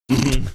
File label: snorting